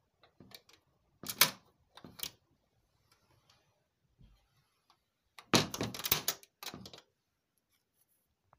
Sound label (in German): Schloss